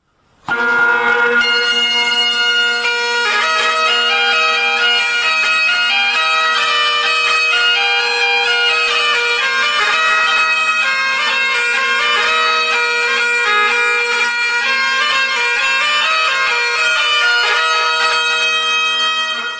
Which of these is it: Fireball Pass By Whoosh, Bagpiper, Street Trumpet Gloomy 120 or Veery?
Bagpiper